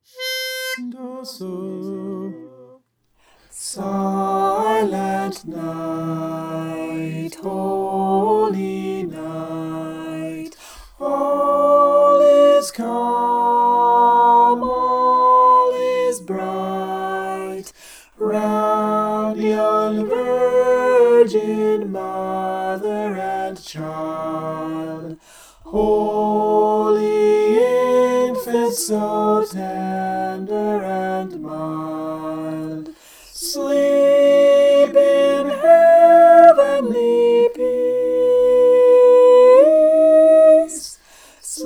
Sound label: soprano